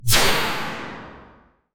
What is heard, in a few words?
magical energy ball spell